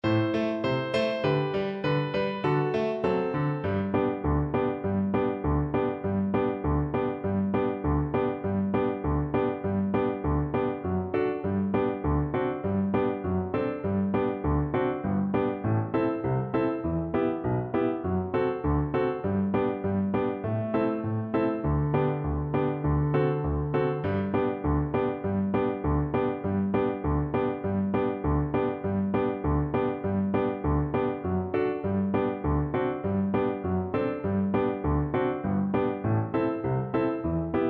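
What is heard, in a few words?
VLC karaoke, Cello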